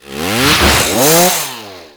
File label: Lunge Attack, Attacks; Warframe; Grineer Ghouls; Ghoul Sawman